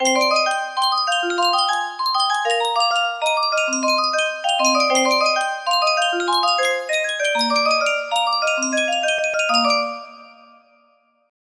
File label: track 3 snare midi